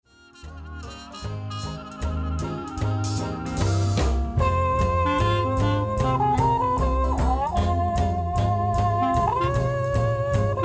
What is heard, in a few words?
trombone